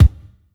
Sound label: short reverb kick sound c sharp key; kick drum samples